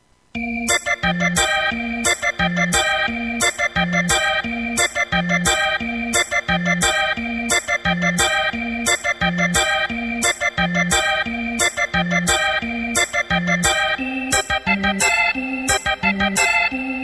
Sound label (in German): Drehorgelmann Playback; midifile